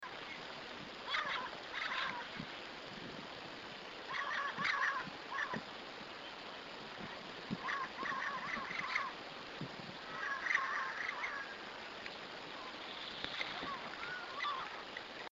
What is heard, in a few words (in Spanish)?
lorohabla, Loro Hablador, Amazona aestiva, Turquoise-fronted Parrot; cantos